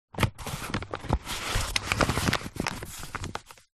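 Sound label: food flour bag paper handling; flour bad paper handling; zapsplat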